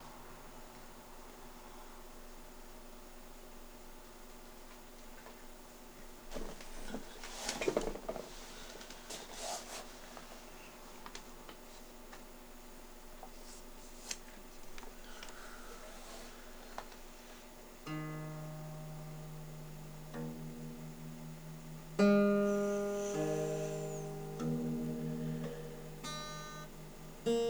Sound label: Ambience; Ambience for April